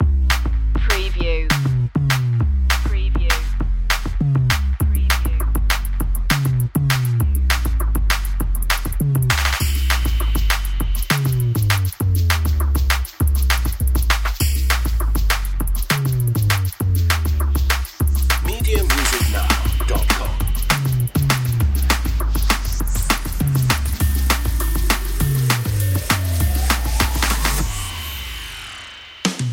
escape plan drums and bass; race

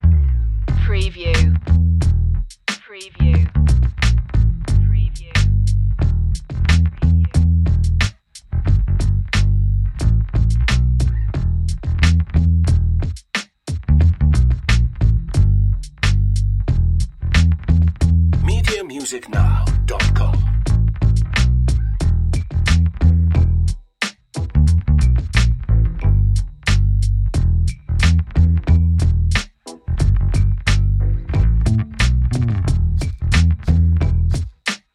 groovy drums and bass; Funky / Groovy

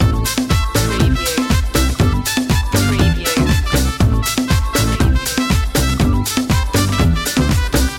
loop, latin spanish

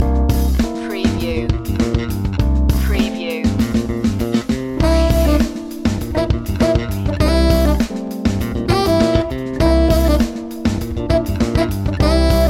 funk cake loop; swagger

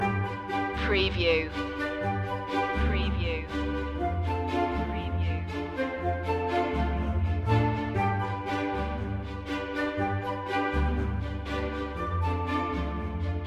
blooming spring short loop; tale

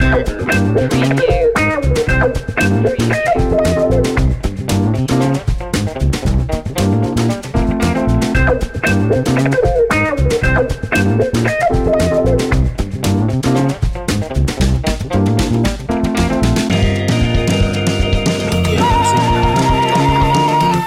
funk loop, swingbeat, Short edit for looping

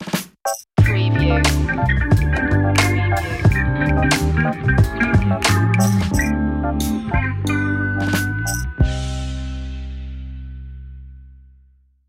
pretty cool funky stinger, chill hop

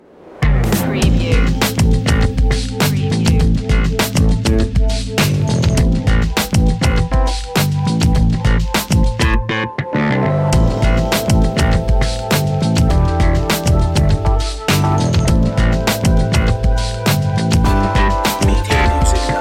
no guitar, funky groovy

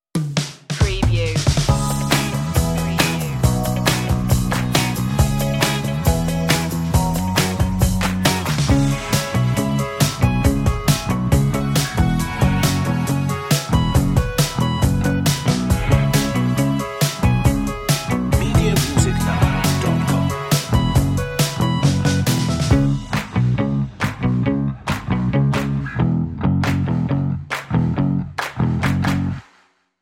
pop; urban-rnb

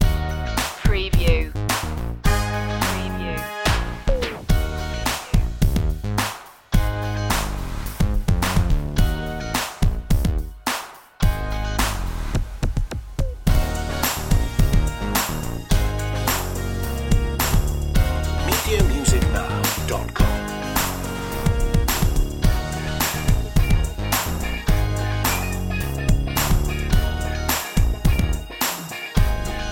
super funky groove, hightech

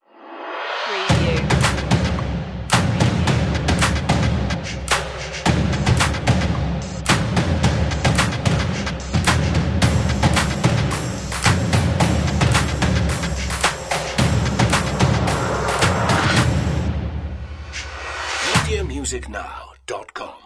urban beat drums stinger, stomp

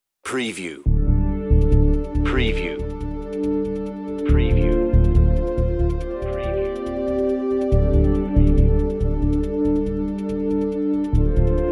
Reflective Underscore, on-hold music

